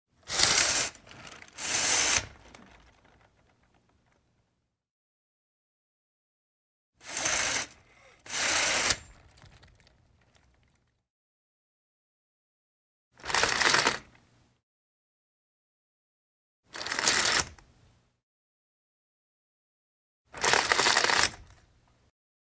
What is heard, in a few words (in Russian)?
zhalyuzi otkrivayut zakrivayut perekladini okna okno